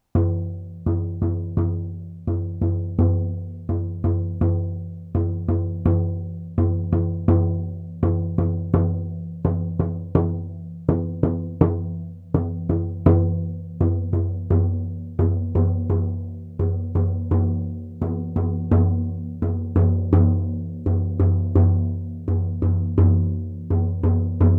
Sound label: Reindeer Healers Shield, drum